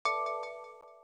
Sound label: bell